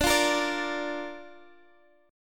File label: D Minor Sharp 5th D Guitar Drop D